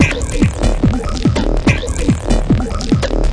dirty reaktor; SYNTH AND ELECTRONIC LOOPS

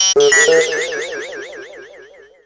Electro FX; Ueb HE Electro FX

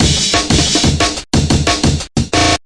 180 hammerhead amen, drum loops and breaks; 161 to 180 bpm

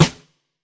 fx drum, Electro FX

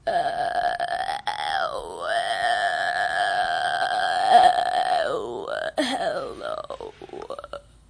weird throat noises, children; VOCALS and SPOKEN WORD

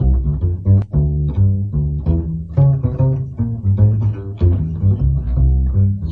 jazz bass; melodic samples

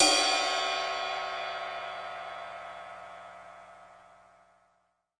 pearlkit ride, Pearl drum kit; REAL LIVE KITS

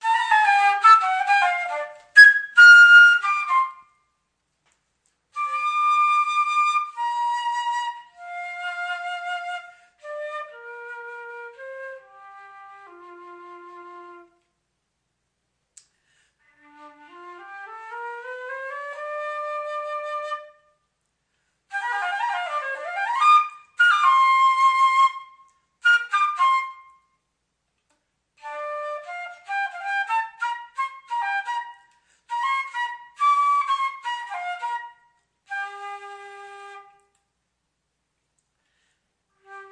flute session; melodic samples

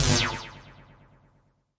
rank noise; Various Strange Sounds and Effects

